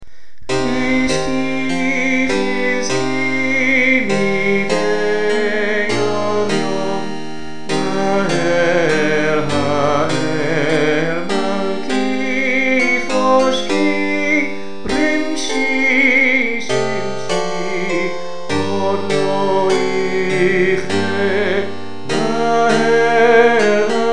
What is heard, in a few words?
Harpsichord; Hishki Hizki; harpsichord accompaniment; Table singing on Shabbat and festivals